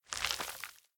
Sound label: arm; Smite; Cabrakan